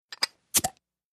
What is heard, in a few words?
Beer Bottle Opn Opner